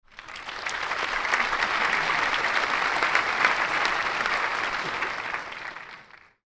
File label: applause